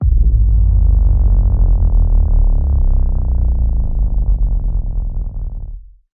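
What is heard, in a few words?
Power Down Liquid Fizz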